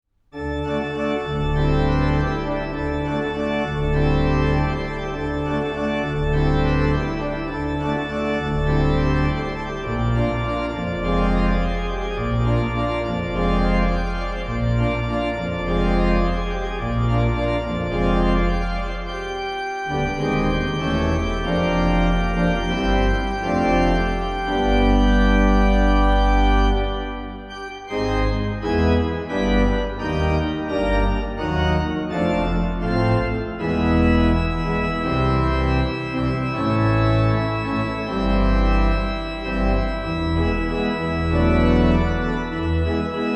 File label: short fanfare in d, organist